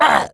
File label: Orc Dmg